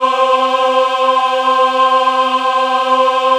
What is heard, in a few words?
C4 MW AH, Choir; USB Soundscan vol.28; Acoustic & Synth; AKAI